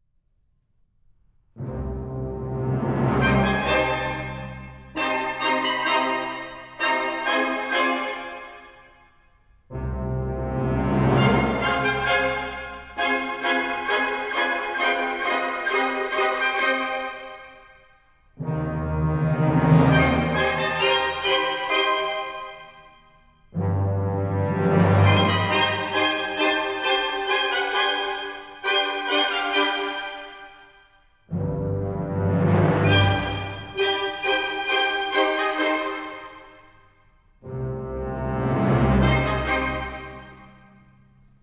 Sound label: sinfonia in c minor, orchestra